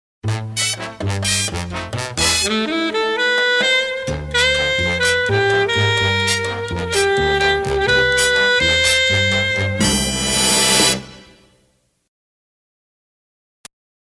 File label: Tango